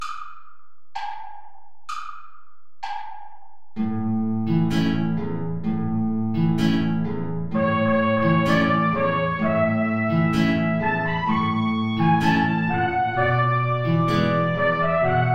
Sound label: tango play along without trumpet; albeniz isaac